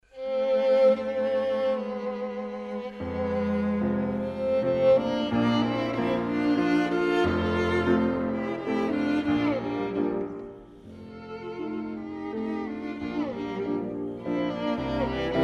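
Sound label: minature violin; juon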